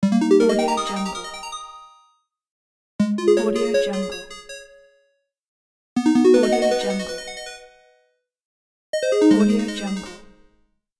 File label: magical points